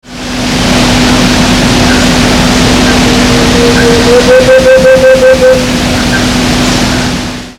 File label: Grallaria varia; PN Itatiaia; Brazil